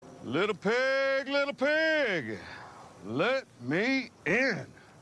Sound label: little pig korea